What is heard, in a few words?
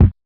Kit Kick, Realistic Concertmate-670